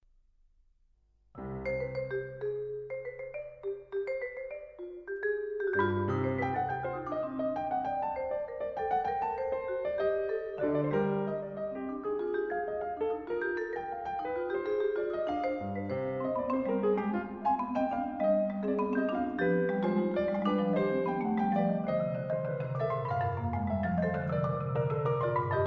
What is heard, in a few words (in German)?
Fuge c moll